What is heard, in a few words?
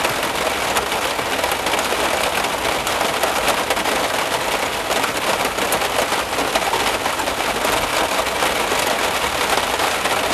Nature Sounds Regen zum Schlafen Rain Sounds Nature Collection - Rain on Car; Nature Sounds XLE Library